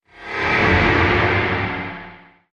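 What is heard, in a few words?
Creature Cyborg Sweep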